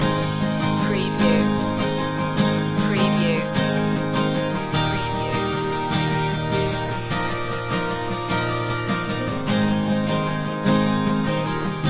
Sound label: loop